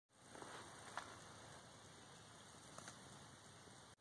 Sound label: butterfly wings fly sound